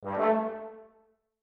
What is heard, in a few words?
trb two note